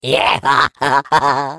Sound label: laugh, fr; Defective Turret; sp sabotage factory defect